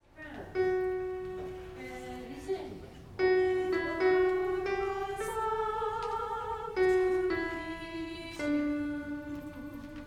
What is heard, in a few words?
May the Road Rise Up soprano refrain